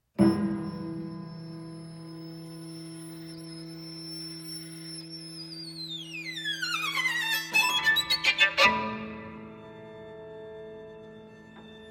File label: strange attractors piano trio